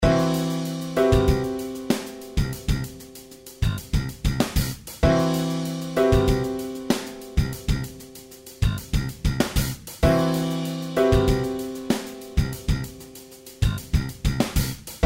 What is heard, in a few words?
jam track, guitar; george stergiou; lick of the week no 18